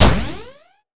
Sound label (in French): phooiiit asc